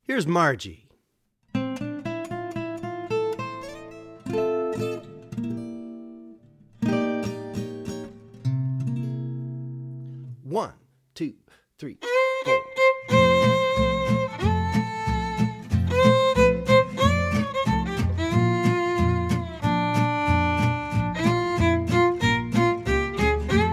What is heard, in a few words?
Melody Regular, swing jazz; Swing Jazz Violin with Hot-Club Rhythm